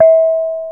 reyong mid, gamelan; gravityharps

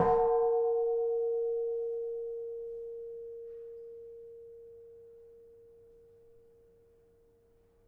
Kenong, gamelan

